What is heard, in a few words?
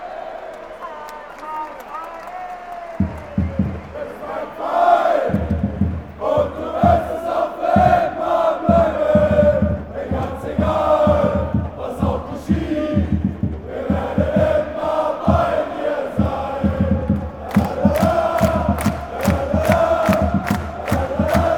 lalala, fan chants; st pauli